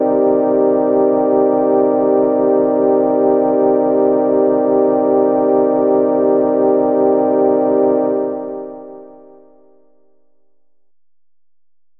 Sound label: pad transcendent; Pads